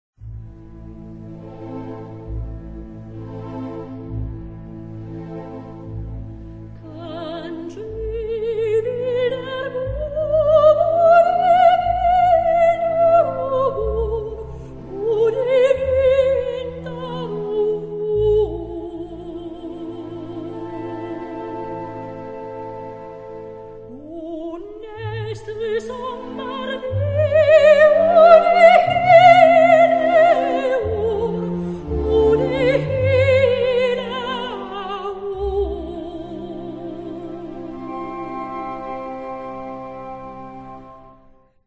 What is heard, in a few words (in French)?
Chanson; Peer Gynt; Chanson de Solveig; Grieg